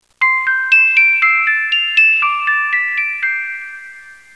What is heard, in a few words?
musicbox; My Animated Word Book